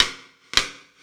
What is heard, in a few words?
Hip House, Drum Machines E-MU Pro-Cussion